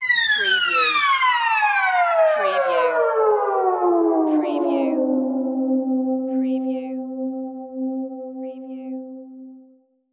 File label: High Dive